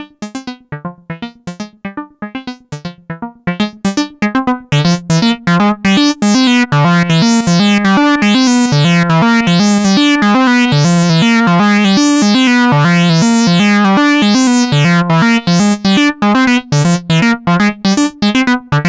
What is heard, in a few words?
VCA Overdrive; Dreadbox Chromatic Module